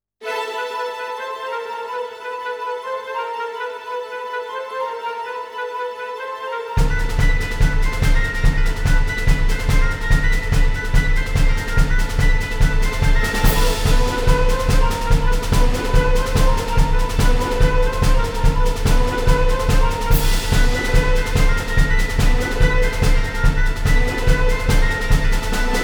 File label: Battle; comyu kuroi ryuu to yasashii oukoku orchestra arrange album